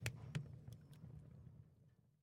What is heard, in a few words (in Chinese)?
熔炉燃烧噼啪声